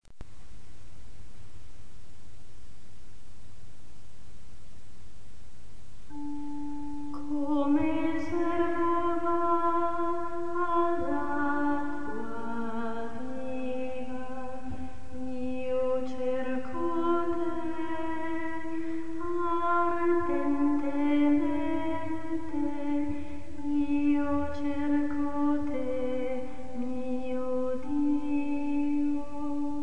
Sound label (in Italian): contralti